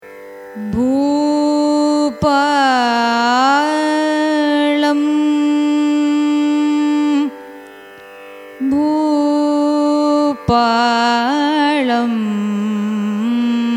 raga bhoopalam signature, carnatic music

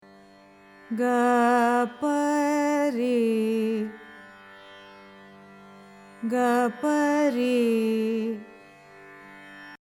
mohanam, carnatic music

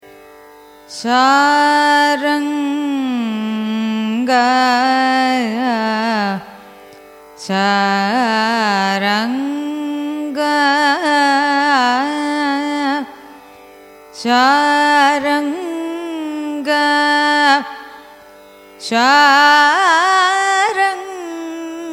raga saranga signature, carnatic music

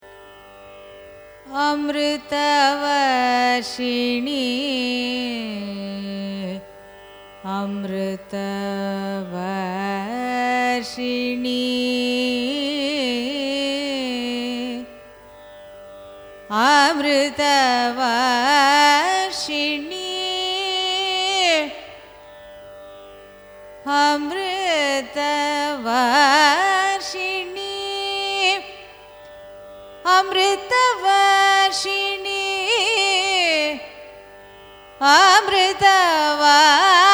raga amrithavarshini signature, carnatic music

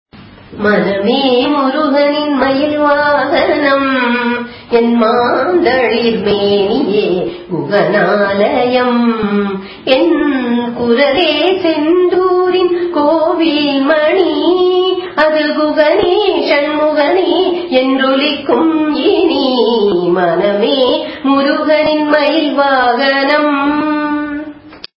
raga hindolam cine; carnatic music

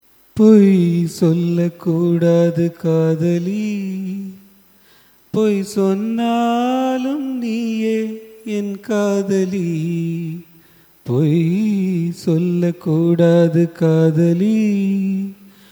raga dwijavanti cine, carnatic music

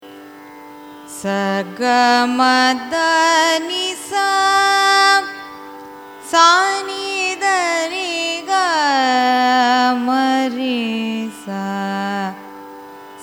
raga maand arohanam avarohanam; carnatic music

